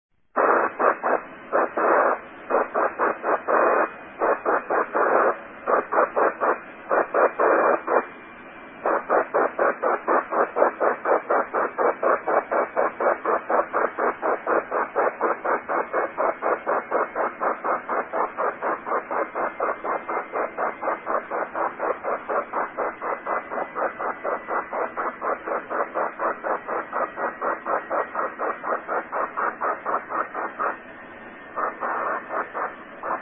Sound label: 2m, vhf